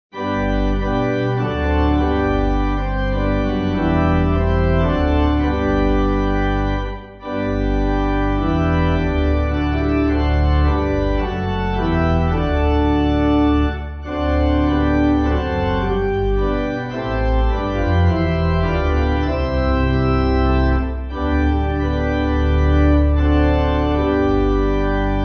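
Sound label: Pipe Organ, Music Backing Files for Churches; Almsgiving 8.8.8.4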